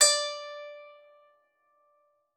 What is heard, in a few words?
KCYM D5, KLAVICYMB; Xsample VOL-8